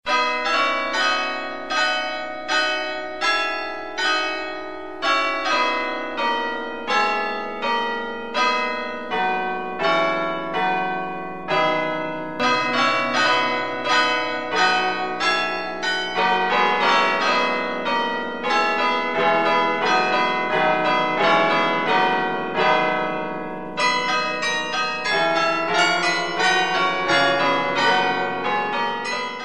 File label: American Bells, electronic carillon bells